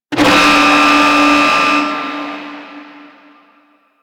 Mech Shocking Iron Warning; Warframe; Vehicles; NechroMech; Abilities

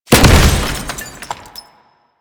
Ammo Explosion; Ammo Ability; DE; Warframe; Frumentarius

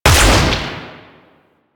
Shot, Weapons Magnum; Warframe; Tenno; Magnus Prime

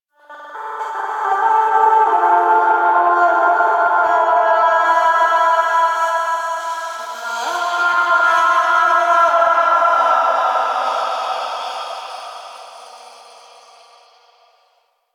Energy Thread Music; Warframe; Apostasy

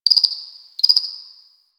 Cricket

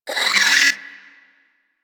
Hyena Vocal Angry; Vocals; Warframe; Hyena Micro

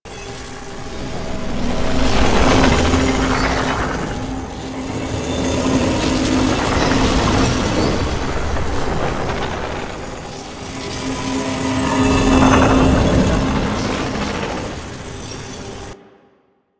Quest Tutorial Weapon Podium Loop; Duviri; Undercroft Tutorial; Ambience

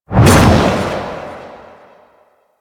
Hover Attack Base; Enemies; Warframe; Warframes; Pax Duviricus

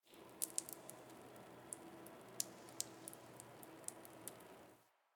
Exterior Water Drip; Ambience; Warframe; NineteenNinetyNine

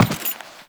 footstep; Step Default, Footsteps; Warframe; Teshin

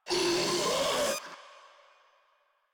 Infested Ancient Player Spotted

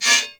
Melee Impact Metal; Warframe; Weapons

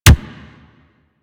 Pulse Laser Punch; Railjack

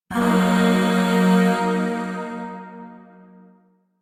Shawzin Blues Chord G; Chords; Warframe; Zariman; Void

